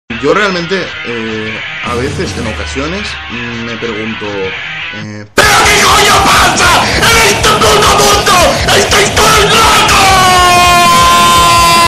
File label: metal